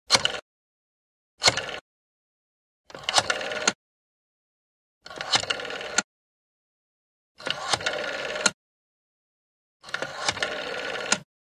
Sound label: Dial a number on a rotary telephone